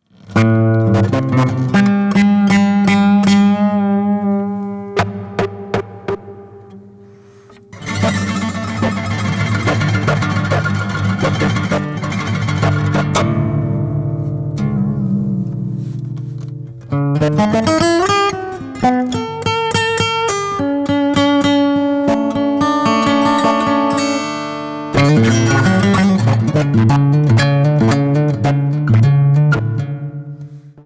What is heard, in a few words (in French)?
glou glou